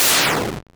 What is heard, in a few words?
hit